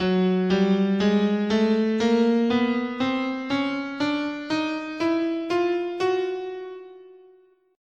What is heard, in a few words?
F sharp Chromatic, scale